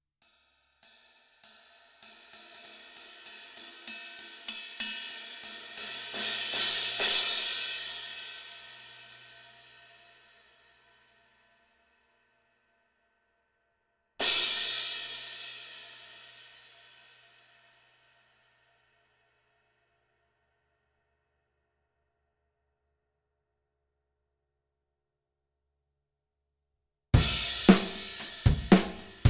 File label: Jazz Medium Thin Crash